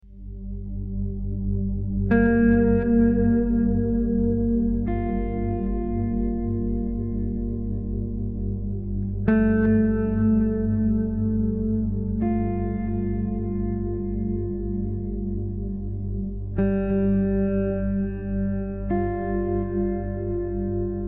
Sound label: Spa Relaxation, Calm Spa Music